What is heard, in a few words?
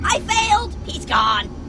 grunt; h3dialogue; staten